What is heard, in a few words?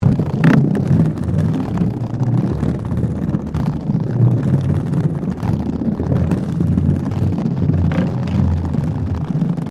ROLLERBLADING BOARDWALK ROUGH LOOP